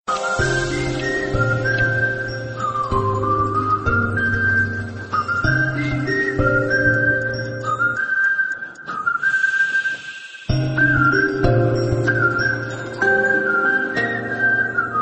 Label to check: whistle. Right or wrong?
Right